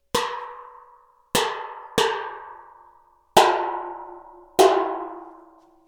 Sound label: Iron Plate Hits